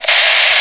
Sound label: static; pcdemo